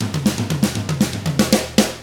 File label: ROCK